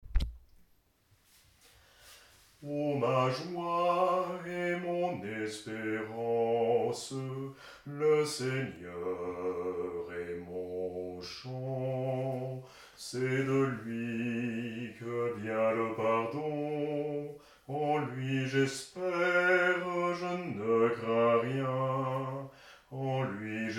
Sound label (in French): BASSE, chant a plusieurs voix; atelier de chant a plusieurs voix